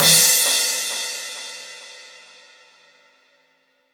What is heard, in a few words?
Crash, Cymbals; Vengeance Samples; VEH2